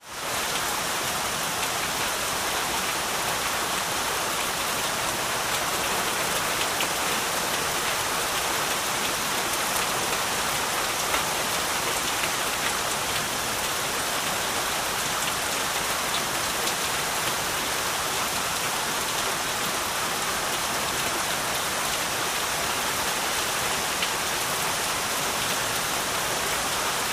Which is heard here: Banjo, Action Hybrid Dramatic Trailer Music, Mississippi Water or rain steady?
rain steady